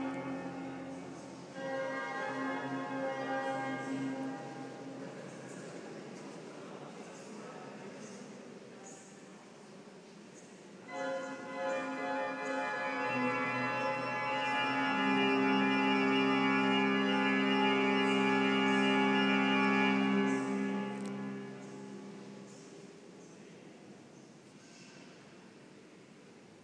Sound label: organ practice in chartres cathedral